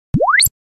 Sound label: Filter Sweep